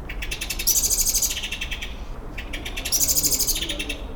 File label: city bird sound black bird